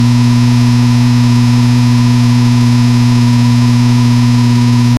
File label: looping sound of a runnin, sports car